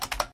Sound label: click; Electronica Digitales; logic gates